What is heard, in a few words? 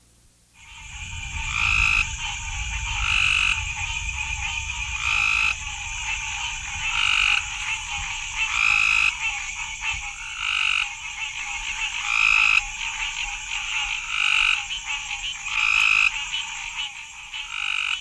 group chorus summer august